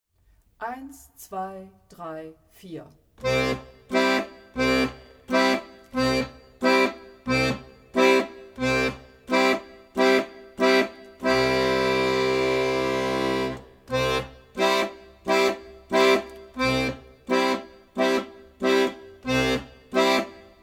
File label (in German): c grundbass und c dur akkord